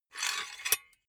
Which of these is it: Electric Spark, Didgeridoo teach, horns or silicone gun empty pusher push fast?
silicone gun empty pusher push fast